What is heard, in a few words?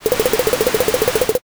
carriage